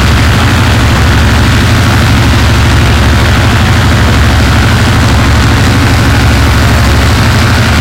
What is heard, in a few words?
an2 side, engines; War Thunder